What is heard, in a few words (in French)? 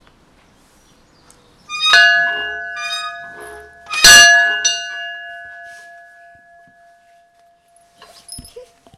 cloche de l ecole; Les ecoles